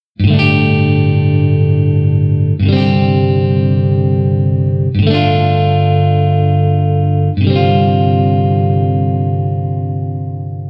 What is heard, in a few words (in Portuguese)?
Campo Maior Acordes G2 G4 G72 G74